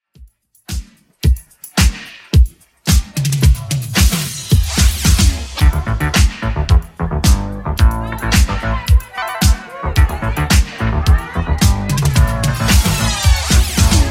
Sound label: 70s Redrum 110bpm